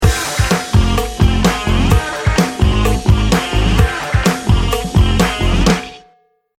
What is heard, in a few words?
start action